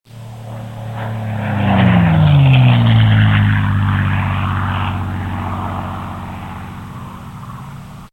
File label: merlin mustang